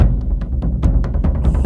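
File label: Music Shield; From Dust; ps3; xbox 360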